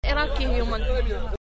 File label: loop